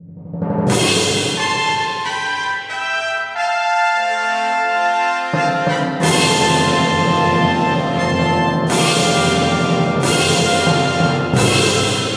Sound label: Fanfare